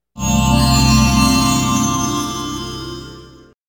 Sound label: Power-Up; Sonic Adventure (Unreleased Tracks)